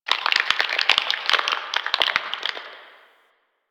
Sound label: Tree Creak; Ambience; Warframe; Quests; The New War; Drifter Camp